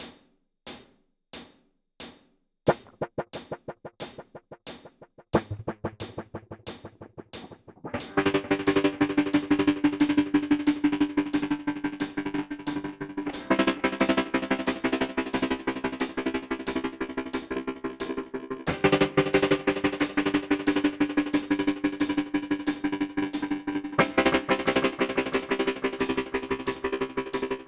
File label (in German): Coole Rhythmen mit Delay 90 BPM Guitar Rig 7 Dirty Gater